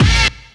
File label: STAB; E-MU Formula 4000 Series Vol. 1; Hip Hop Nation; Raw Phatt